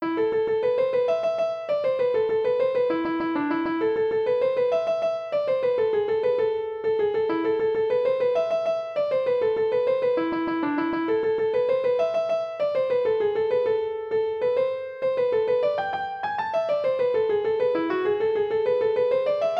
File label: jig; boeuf trad